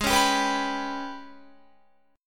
Ab Minor Major 7th Double Flat 5th, down, Guitar; strummed; AbmM7bb5

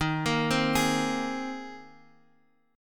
C Minor 7th Sharp 5th, D sharp, guitar chord

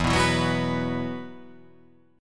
E Suspended 2nd

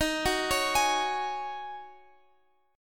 Eb Minor 11th Eb down Guitar Open F; Ebm11 arpeggiated

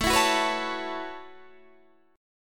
A 6th Add 9th A down Guitar DADGAD

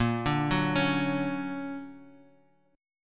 D Augmented Major 7th, chord

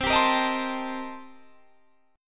C-Minor 13th-C-down, guitar; strummed; JGuitar

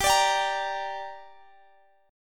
G Minor, Guitar Standard; strummed; x,x,17,19,20,18; JGuitar

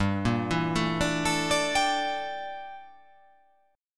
G Minor 6th Add 9th G down

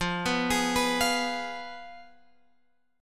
F Diminished, Guitar, Open G; down; chord shape

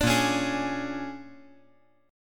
Bb 7th Sharp 9th Bb; Bb7#9 strummed; Bb7#9 Chord

